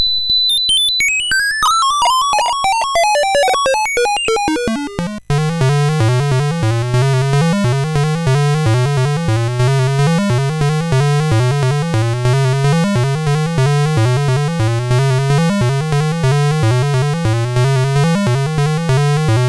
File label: Musicbox, sid MOS6581R4